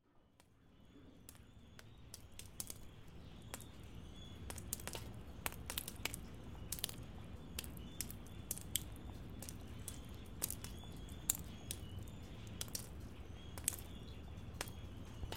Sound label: raindrops